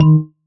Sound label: marimba raincoat; ゆめ2っき